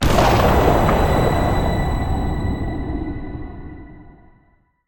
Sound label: water Wild Spread Anim; elements